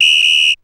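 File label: RX21L WHISTLE; Crypton - DrumBase5000; RX15+17+21L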